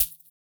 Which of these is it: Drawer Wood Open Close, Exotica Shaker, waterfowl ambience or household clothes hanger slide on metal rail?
Exotica Shaker